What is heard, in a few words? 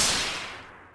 mesh; ambient; Shadow Man